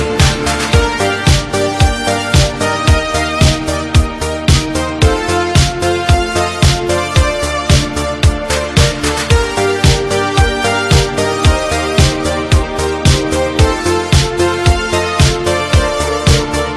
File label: Melody